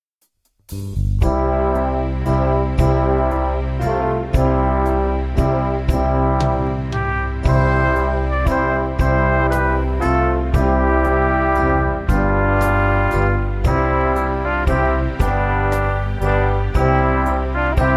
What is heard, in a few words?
Pastorale in Brass